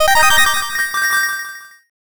jackpot

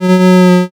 creak

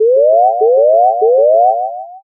scan

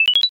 score up

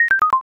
score down

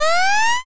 long press

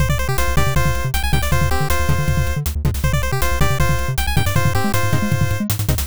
bgm

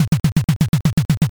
taiko roll

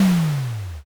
earth magic

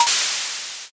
can open

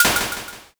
egg crack

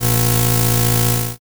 weld

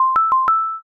washer done

